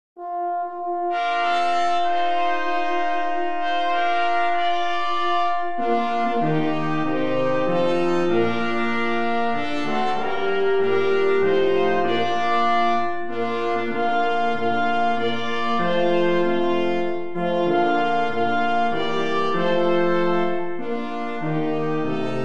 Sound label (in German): blechblasquintett